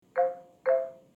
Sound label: hop